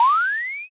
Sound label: vanish